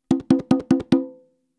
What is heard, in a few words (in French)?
frise, congas